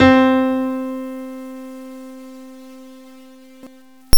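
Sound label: grand piano; gmplay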